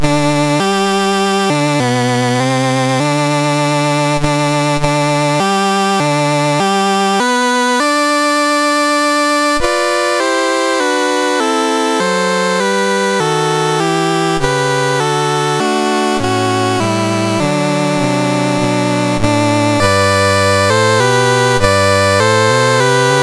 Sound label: MOS6581R2, National Anthems; Nigeria; Dave Marquis